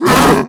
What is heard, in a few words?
grunt hard, zombie vox; creatures